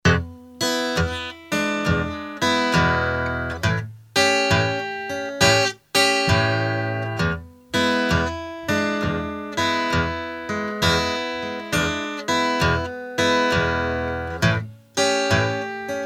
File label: deep south blues